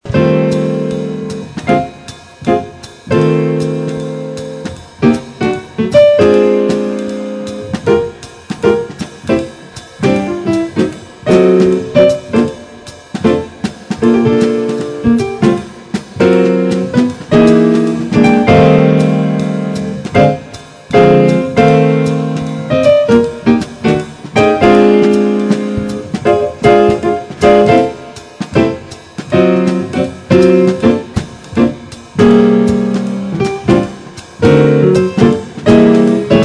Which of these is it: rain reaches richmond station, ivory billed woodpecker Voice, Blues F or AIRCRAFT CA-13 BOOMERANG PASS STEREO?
Blues F